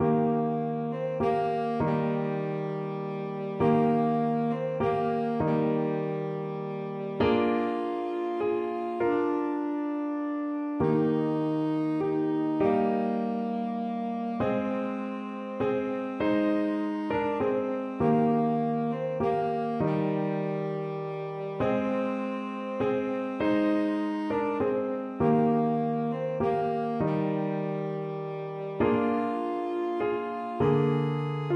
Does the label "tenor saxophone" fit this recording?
Yes